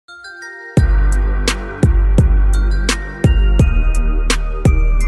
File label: Beautiful Background Music Ringtone; ringtones